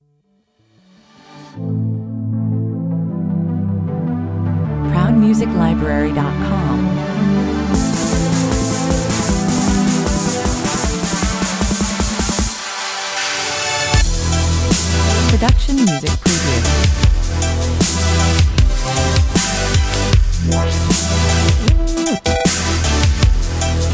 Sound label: beat